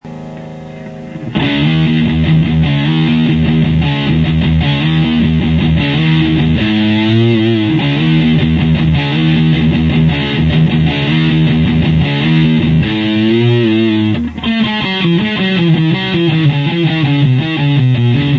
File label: new amp half od